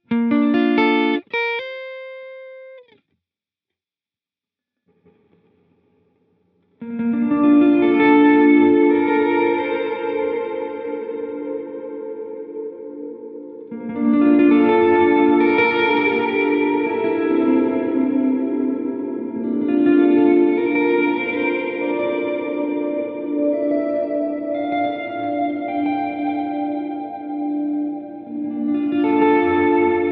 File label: Ambient